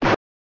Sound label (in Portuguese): chute vento; Ben 10 - Alien Rivals; ThrillGames